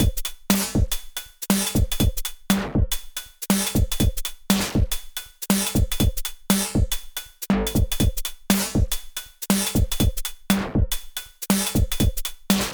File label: Elektron Overbridge 2.0 4 Track Groove